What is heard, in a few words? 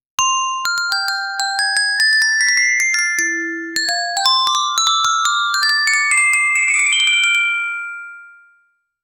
xylophone ringtone